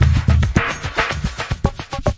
thick echoes, 110 bpm, drum loop; 101 to 110 bpm

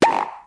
alarm blop

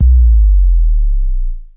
pillar subbass; Basses

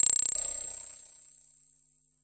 crystal synth ringer

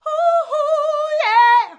diva ohh hoo yeah

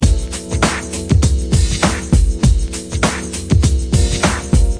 100 soulbreak, sampled music loop; MELODIC LOOPS; ripoff

